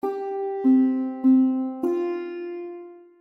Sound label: sitar; AJ Hipkins